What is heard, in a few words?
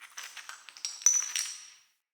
Cave Ocean Rock Crumble